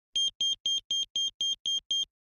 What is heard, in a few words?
Wireless pager alarms in various tones. Alarm, Pager Beep, Pager; beeps